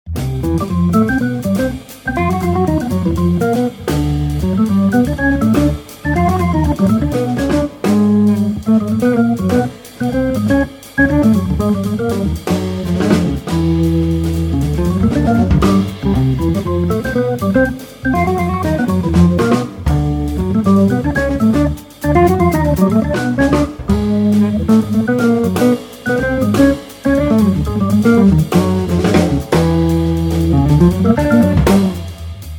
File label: organ trio